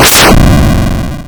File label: Hard PCM Chip; chiptune